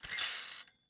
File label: Foley Metal Spurs Spinning Fast